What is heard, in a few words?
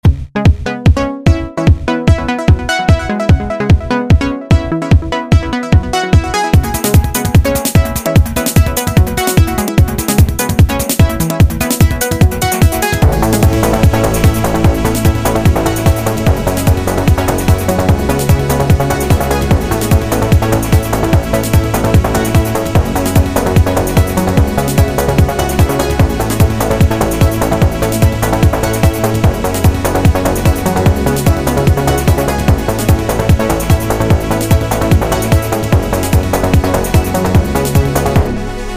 Noise Pulse; ps vita; TxK